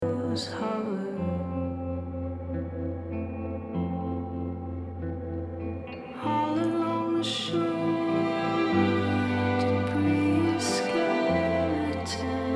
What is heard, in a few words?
Strings